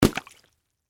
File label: Splash Wet Mud, Texture, Splash, Wet, Mud; Splashes; Objects; BlastWave